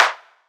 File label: Clap, Afrohouse; Afro Drums; One Shots